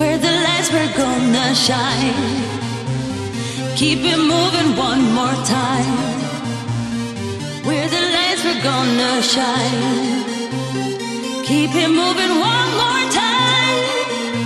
disco music 432 Hz

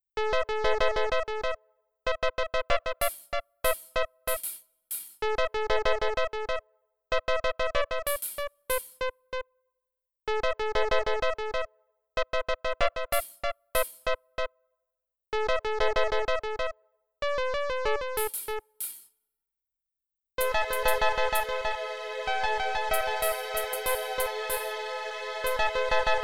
fitness music for stretching, 432 hz

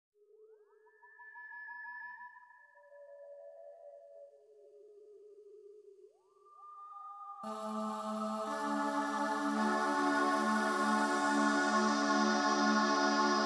spa music 432 Hz; orange dreams

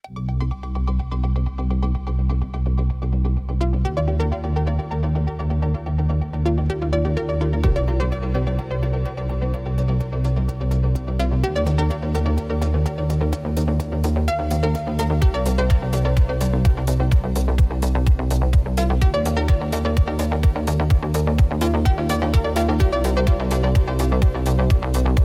Electronic Impulse

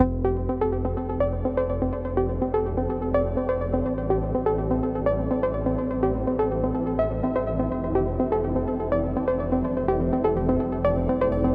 Electronic Impulse

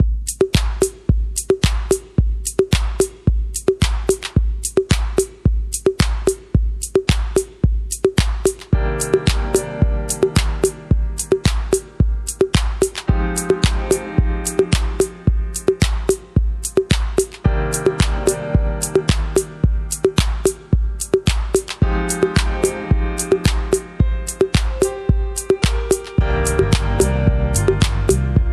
Chillout Vibes

